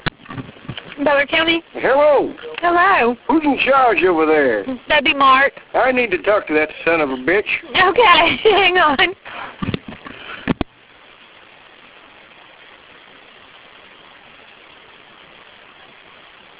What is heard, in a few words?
sob